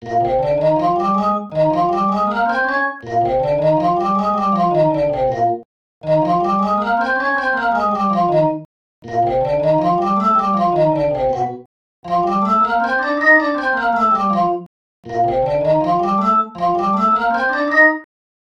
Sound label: Bb Blues Scale Outline